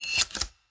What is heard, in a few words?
inv pickup clip, player, inventory